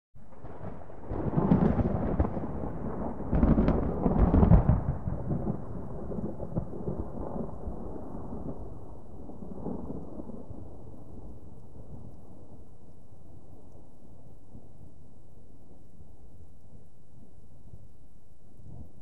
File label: Thunder; Rippling Thunder Pan By - R>l